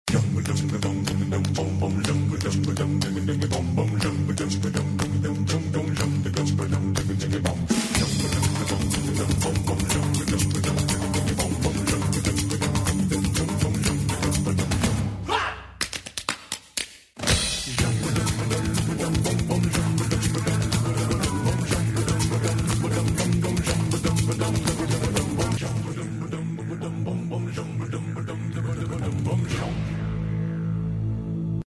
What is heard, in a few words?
level music; tak bites